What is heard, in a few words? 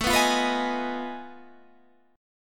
F 7th Sharp 9th Flat 5th A